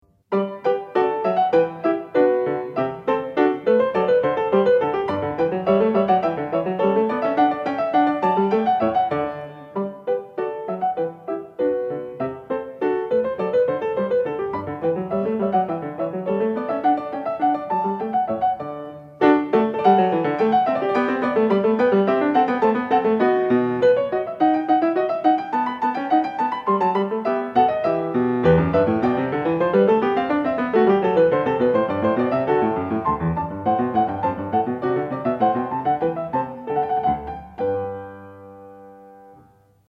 French Suite for keyboard No. 5 in G major, BWV 816- 4. Gavotte; Bach, Johann Sebastian